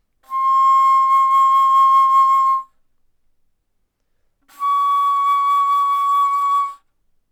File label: Bass Flute C6 Db6